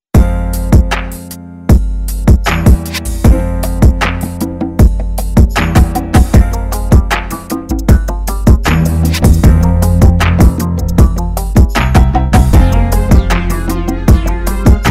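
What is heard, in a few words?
Arabian R&B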